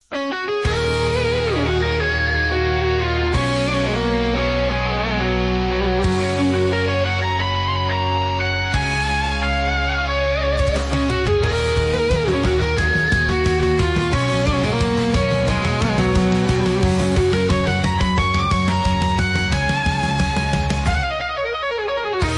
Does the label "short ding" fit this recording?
No